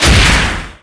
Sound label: Enemy Masquerade Active Shot; disney star smash android ios mobile gamerip 2020